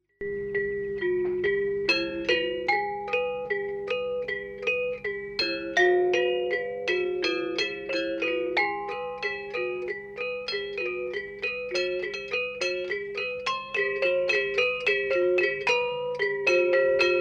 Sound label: Asak selunding Rejang Semengan